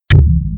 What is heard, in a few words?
Tab Close; RimWorld Biotech; RimThemes; Hunt For Me